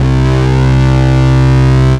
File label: Bass Note C